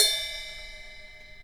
CYMB RING, AMB SOUNDS

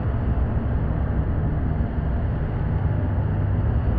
sparse soundscape samples; ReLooped

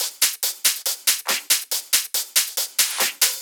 Loops 140 BPM Hihat Percussion Loops

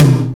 TOM, Dance

